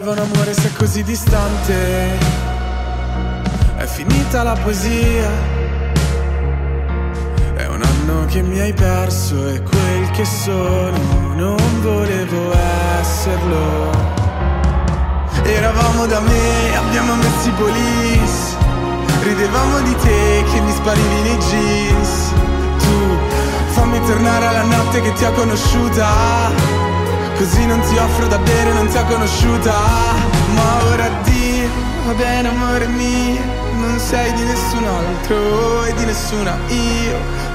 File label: TANGO